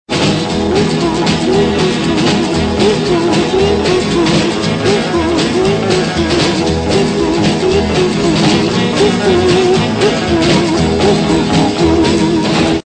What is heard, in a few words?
Woo Hoo